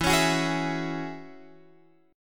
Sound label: E 6th Add 9th, Guitar, Open F; E6add9 strummed; JGuitar